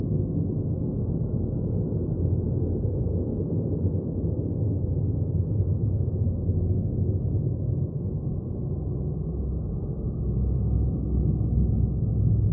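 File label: ambience dungeon howl; skyrim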